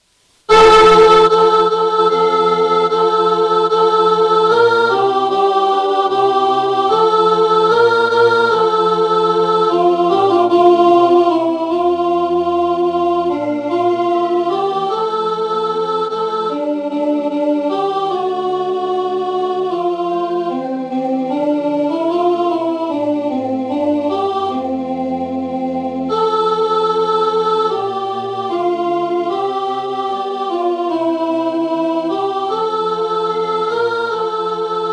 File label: Alto, rehearsal